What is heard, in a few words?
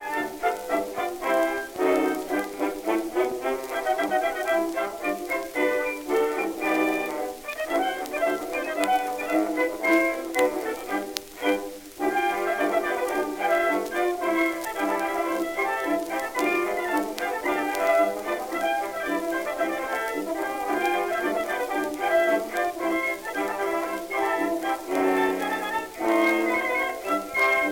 Jolly jingles, jukebox blues